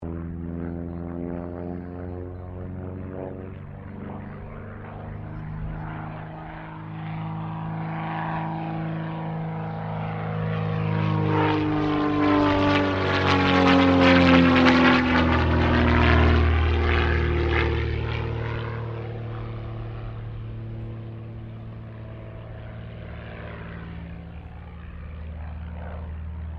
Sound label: AIRCRAFT CT4A PASS, RAAF CT4 training aircraft; Airplanes; Vehicles; SoundEffects-Foundation1